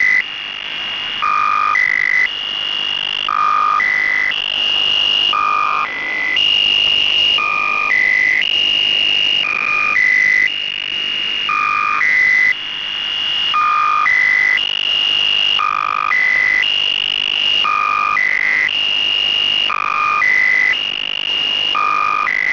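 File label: squeaky wheel marker 5473.00 usb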